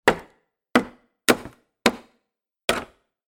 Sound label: industrial hammer nail wood internal; zapsplat